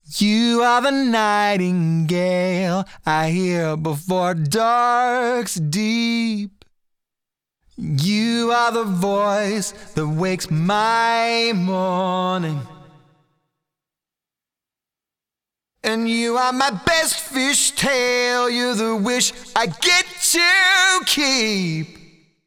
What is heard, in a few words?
Male Vocal Delay; 24/96, Ping Pong, sixteenth sync, Mod halfway up, Delay 2 at 152ms